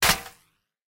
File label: bullet hit armor; War Thunder